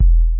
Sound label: Kicks; Heartbeats